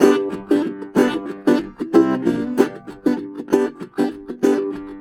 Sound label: Blues Guitar Samples